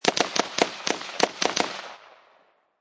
Machine Gun Colt AR-15 Long Burst Random Fire Distant Range